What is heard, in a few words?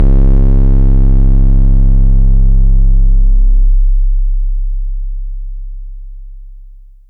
kick, Korg ER-1; 300 Drum Machines; Kit 4